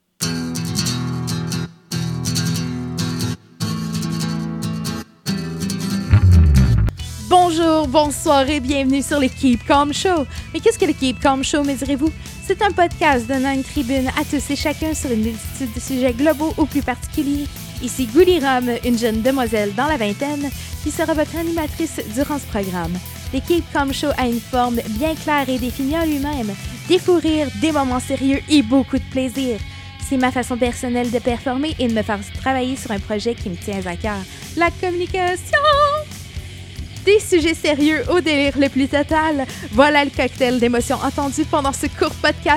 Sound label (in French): spot pub musique